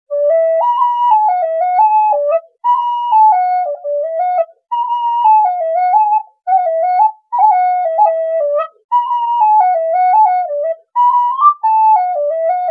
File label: slide; sony minidisk recorder